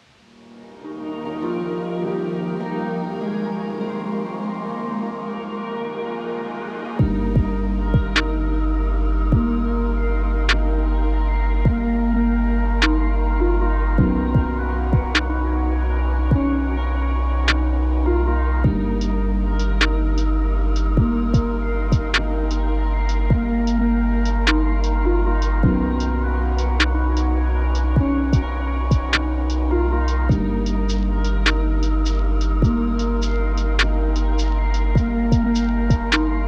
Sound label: lofi